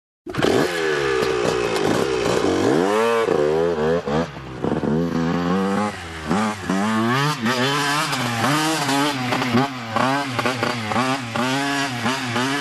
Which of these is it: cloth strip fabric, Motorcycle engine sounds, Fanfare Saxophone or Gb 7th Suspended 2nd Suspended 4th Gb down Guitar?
Motorcycle engine sounds